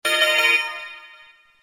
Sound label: glow hockey pause; glow hockey 2